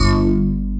BUG BASS; Pro-Rec - Synthsonic; BASSES

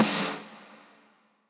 Snare, retro house samples, Drum Hits

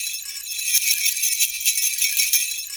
TANZNIABEL, TANZ.BELL; Spectrasonics; Heart of Africa 1

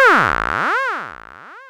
Sfx, Trance; Zero-G Trance Inducer; General Sfx

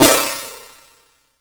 SNR, Drums; Polyend; Simulacrum Sounds; 1 Shots

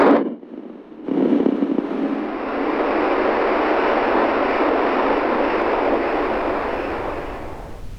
ghost transition

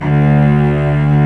ARCO CELLI; E-MU Formula 4000 Series Vol. 5; Protozoa; Proteus2 Instrmt

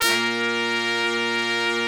BRS, R&R Horns; Roland - Brass, Strings, Hits and Combos

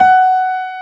STUDY PNO; East West; Global Sounds

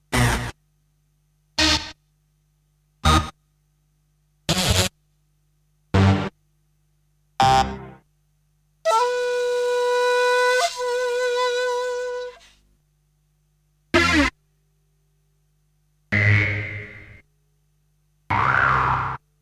Stabs; Kniteforce; 1000 Samples Volume 1

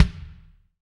BD, Roland; Sound & Vision - Gigapack I

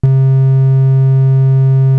SYN WAVE 6

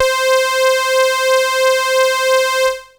Techno leads, hits and riffs

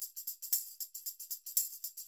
TAMB, Percussionworks; Sampleheads; NYC Percussionworks

